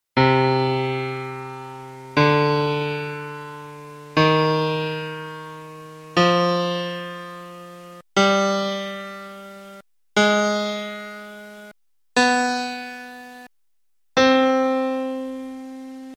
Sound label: harmonic scale